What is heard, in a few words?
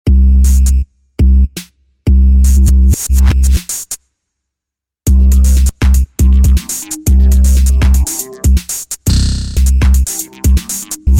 Jingle, radio; MetaSinus com